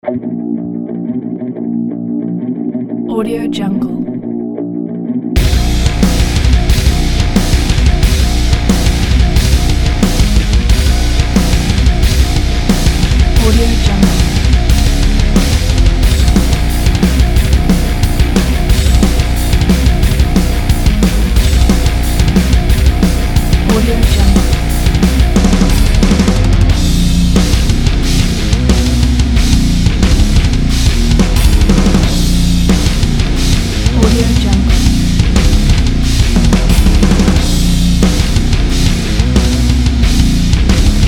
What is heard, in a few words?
massive metal warrior; mdgsoundstudio